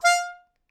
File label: Melodica Short; Short Notes Chromatic